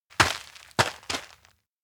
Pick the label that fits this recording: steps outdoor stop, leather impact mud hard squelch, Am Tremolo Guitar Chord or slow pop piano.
steps outdoor stop